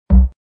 Normal Reel Stop; hockey

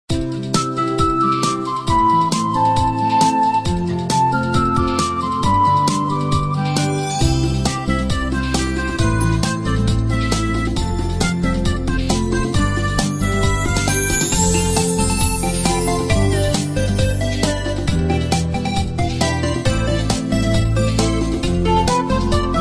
MUSIC BACK

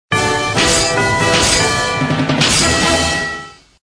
enter Free

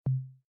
Stop Button; slotssister

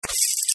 jetton recyle

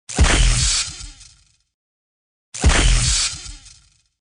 moster Dead

